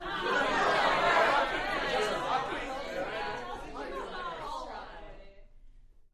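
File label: Crowd 15 Interior Rowdy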